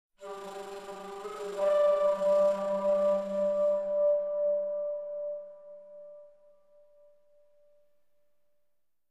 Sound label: pipe; Myst III Exile